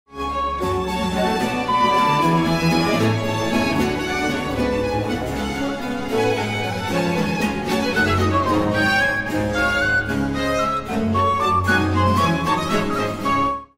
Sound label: orchestra ringtone